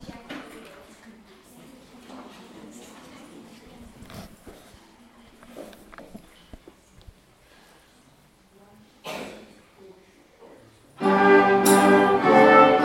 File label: music concert